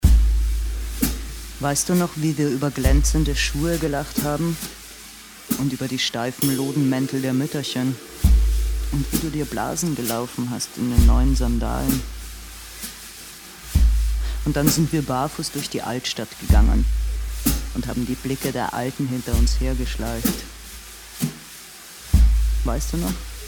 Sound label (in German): Jazzschlagzeuger; Ich als der Jazzschlagzeuger